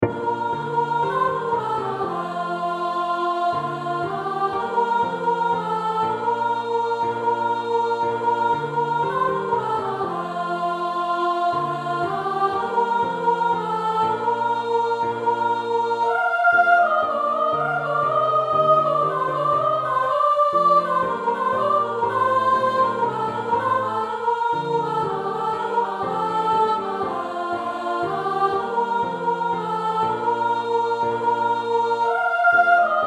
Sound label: Soprano; Ding dong merrily on high